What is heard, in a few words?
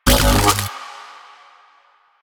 Updated Shot, Weapons; Warframe; Moa Pet; Tazicor